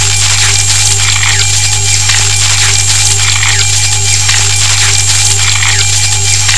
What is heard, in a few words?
energy idle; mew